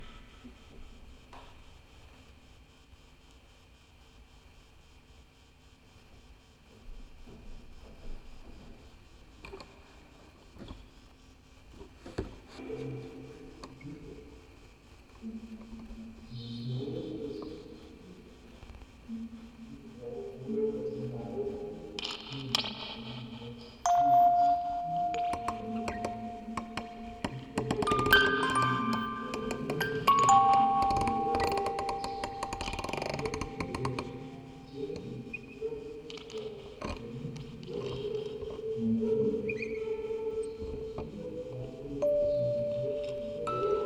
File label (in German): Aufnahme Spieluhren Quartett 4 Kanal stereo